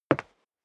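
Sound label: step dress boots wood parquet single left, footsteps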